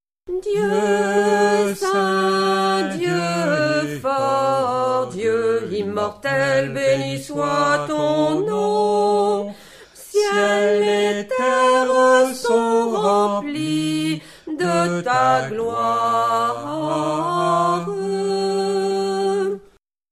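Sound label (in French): Sanctus de Lourdes Tenor Basse, chœur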